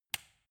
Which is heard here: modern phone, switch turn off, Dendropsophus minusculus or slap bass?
switch turn off